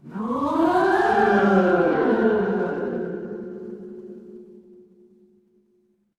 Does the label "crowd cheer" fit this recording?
Yes